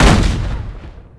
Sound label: shield; NanoFXv2; ExplosionFX; ExpShield